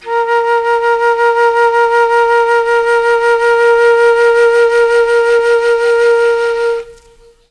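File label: A#2 chamberlin flute, Mellotron; Mike Pinder - Mellotron Archive CD; CHMBLN FLUTE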